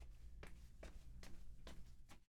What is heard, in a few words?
Steps